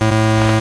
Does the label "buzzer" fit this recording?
Yes